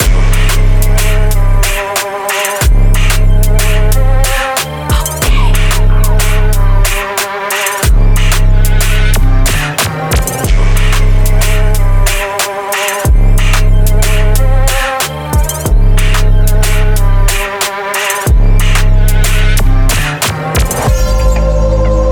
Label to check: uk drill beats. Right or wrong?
Right